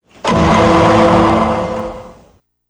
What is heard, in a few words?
Doink Doink; Law And Order